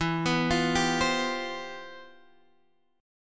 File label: C Major 11th E